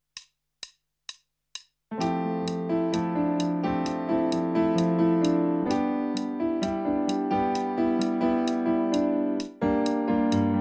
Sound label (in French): Piano Rythmique Clic; akai apc mini